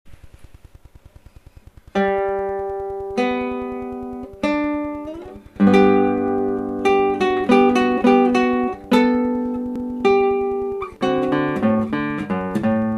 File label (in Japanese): カアサパ, ギター; カアサパ、西野俊平で